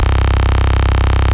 Basses Root C; Vengeance Samples

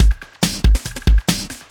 Loops BB 140, BreakBeats; Vengeance Samples

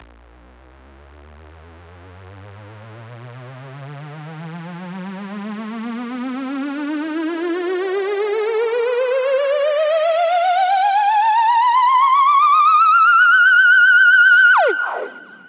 Special Sounds Root F# 128 BPM; Vengeance Samples; VEH3 Special Sounds

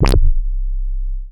Synth Bass; Vengeance Samples; VEE Synth Shots

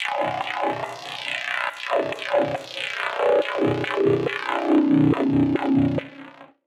Loop, Synth; Ghosthack

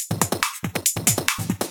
Loop 140 BPM; Vengeance Samples

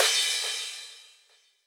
Cymbals crash; Vengeance Samples

